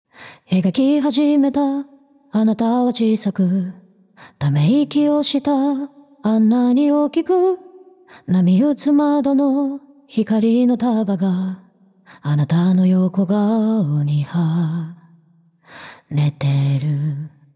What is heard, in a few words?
Dark